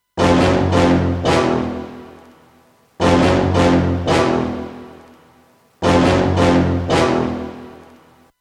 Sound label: SPL Vitalizer MK3